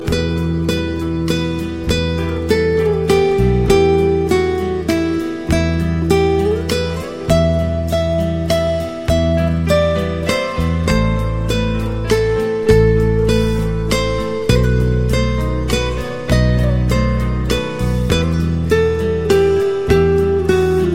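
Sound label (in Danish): kærlighedens romantik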